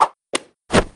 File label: cane right